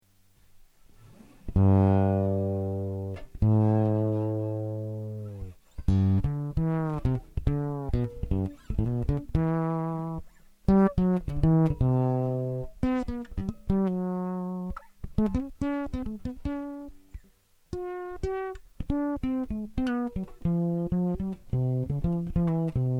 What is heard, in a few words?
piezo, bass; benavente